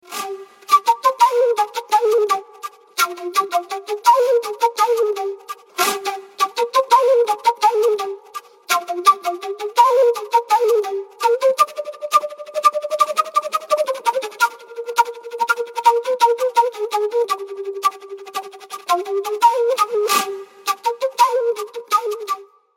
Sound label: flute bgm ringtone; android; jailer